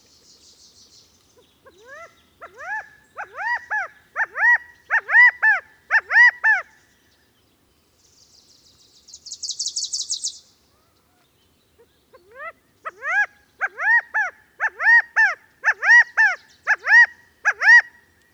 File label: California Quail